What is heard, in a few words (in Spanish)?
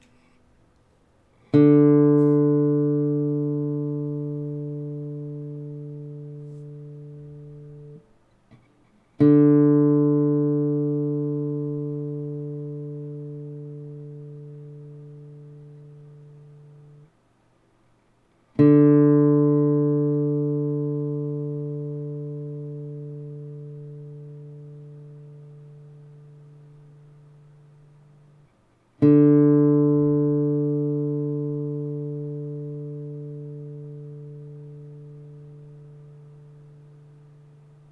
Sonido de guitarra española cuerda 4 Re D; afinar una guitarra